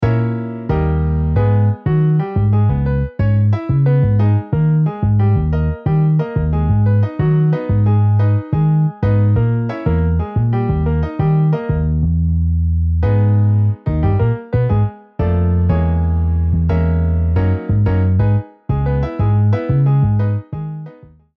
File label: Piano Bass MIDI